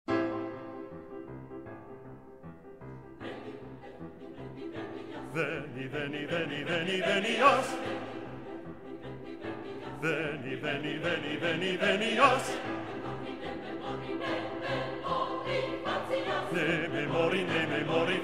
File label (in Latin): Veni veni venias Coro 1 Bass